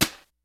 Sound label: JAZZ KIT; Kid Nepro; Elite